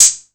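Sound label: 808 open hi hat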